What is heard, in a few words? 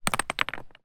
foley stone med drop down on other